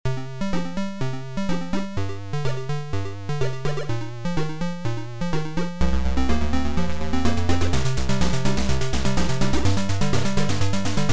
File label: Game music; Wopgamma